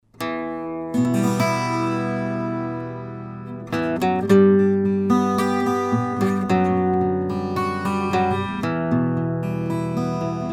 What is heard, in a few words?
front 12string